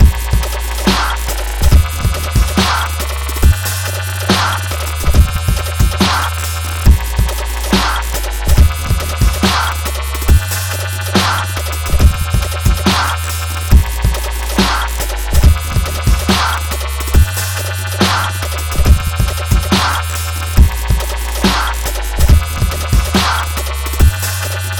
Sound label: dubstep wobble